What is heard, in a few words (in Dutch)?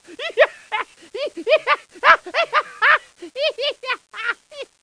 oehoeh